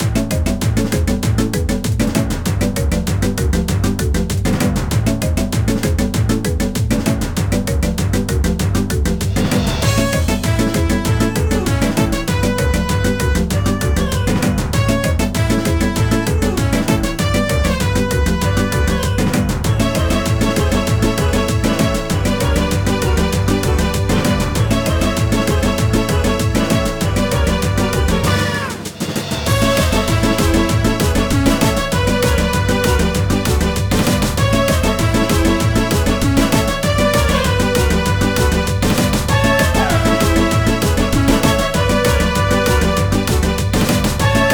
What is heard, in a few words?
train faster